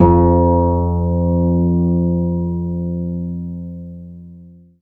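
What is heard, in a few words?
HARP G4 SUS; ILIO; Synclavier Strings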